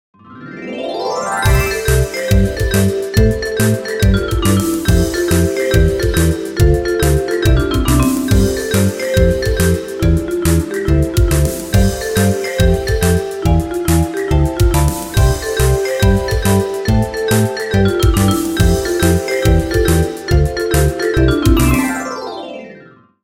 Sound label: jingle